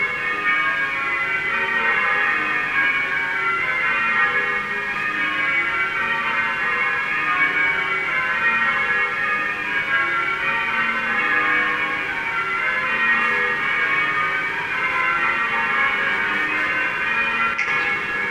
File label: Quarter Peal of Grandsire Triples Rung; St John’s